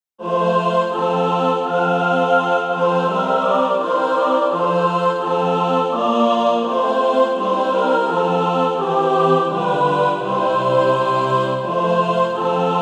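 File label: mixed, SATB Practise Music; Christ Who Left His Home in Glory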